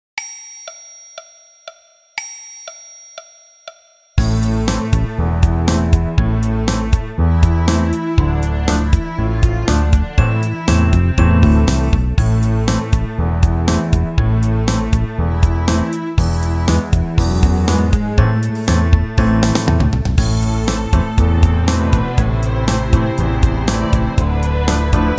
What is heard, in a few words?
Orchestra Version